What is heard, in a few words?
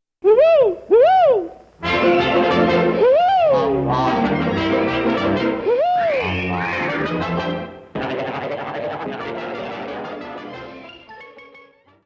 ahh whoo ah whoo